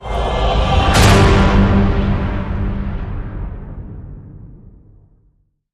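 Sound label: Hit Orchestra Cave Men G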